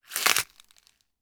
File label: Leeks, Break, Bone, Crack, Body, Arm; Mattia Cellotto; Crunch Mode